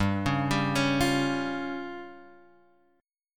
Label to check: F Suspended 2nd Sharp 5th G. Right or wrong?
Right